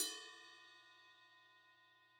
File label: Ride; apulSoft apTrigga2; Multi-Velocity Cymbal Samples